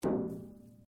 RUBBER ON METAL; Sound Effects:Materials

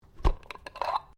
JAR GLASS LID METAL SCREW OFF; Various kitchen jars; Twists; Objects

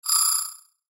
Beep Tone Signal Multimedia; Web Interactive; Blastwave; Technology

